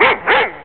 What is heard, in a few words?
dog bite; wolfstuff; wolf3d